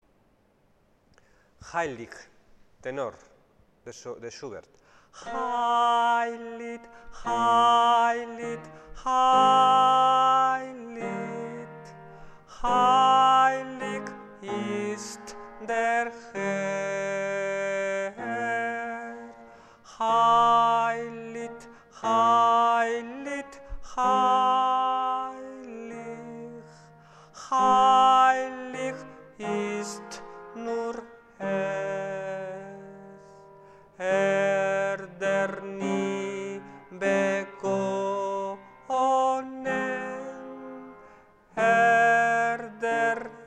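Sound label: tenor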